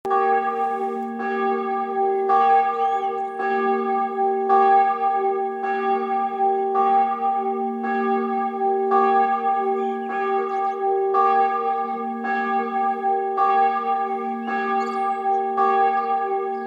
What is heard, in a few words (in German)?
Morgenglocke